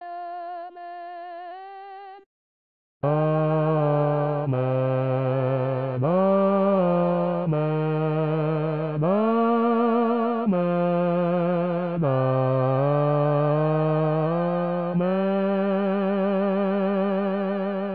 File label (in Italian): Amen Solenne Bassi